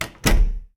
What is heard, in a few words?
door close; Golden Crayon Sounds